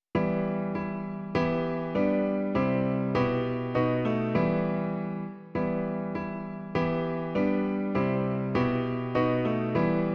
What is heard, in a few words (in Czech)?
Miluj Pána Boha svého - klavír; Klavírní verze